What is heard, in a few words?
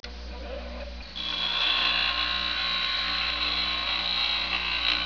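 grind metal; Image Bonanza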